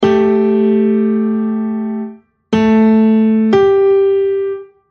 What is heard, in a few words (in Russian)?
septima